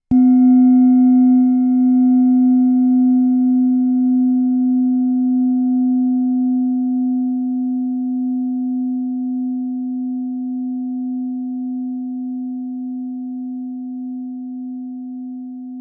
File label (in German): klangschale sonne